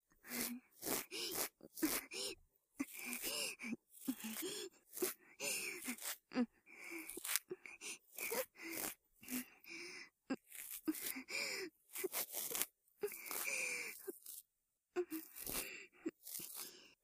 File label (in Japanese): 真緒ループ耳かき（右立体版）